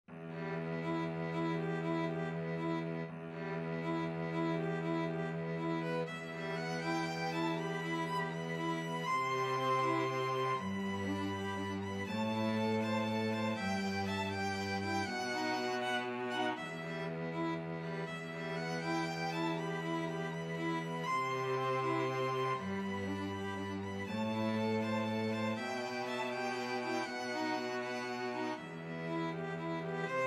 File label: vltava, 2 violins cello